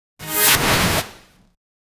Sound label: futuristic cyberpunk swip, objective